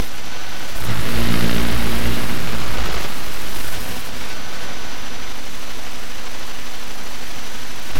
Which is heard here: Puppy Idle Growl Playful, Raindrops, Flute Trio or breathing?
breathing